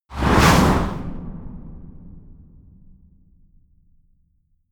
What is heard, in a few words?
wipe